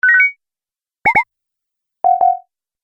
Multimedia Small Sound Pack, high tech sound effects